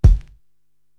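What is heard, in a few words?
BD Sleepy, bass drum, drum machine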